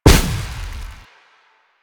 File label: Updated Shot, Weapons; Warframe; Moa Pet; Cryotra